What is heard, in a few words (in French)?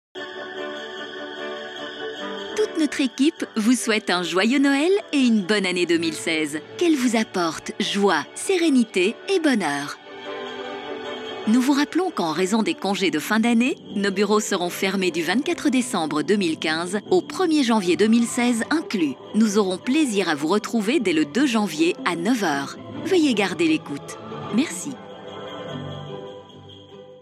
Noel En Chansons